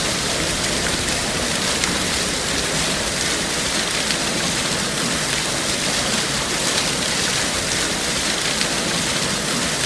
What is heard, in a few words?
Fountain